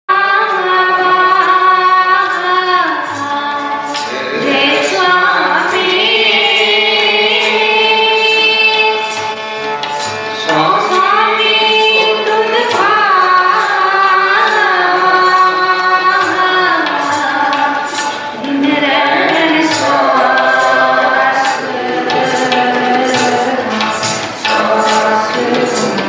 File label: Keertan; Dhin Rain Saas Saas Gun Gaavaa